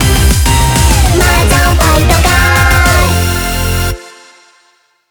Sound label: BGM Reach; Mahjong Fight Girl (Arcade) (gamerip) (2023)